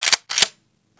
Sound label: semi reload, semiauto